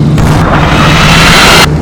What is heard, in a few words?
105mm in air; ac-130 kill sounds